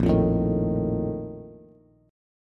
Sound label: Bb Major 7th Suspended 4th, Bass; strummed; JGuitar